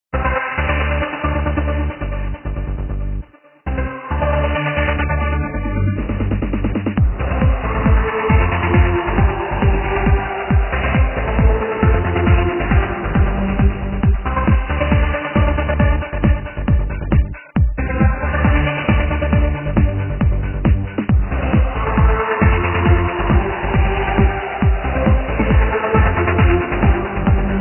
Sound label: Trance Up; ToneRack